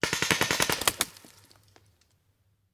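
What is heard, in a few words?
Paintball Gun Impact; Sound Ideas; Guns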